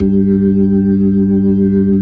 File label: B-3 Jazz; Keyboards of The 60's and 70's